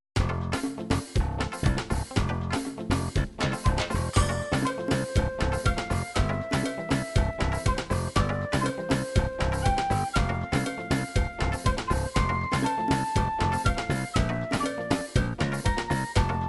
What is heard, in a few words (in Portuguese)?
ritmo de samba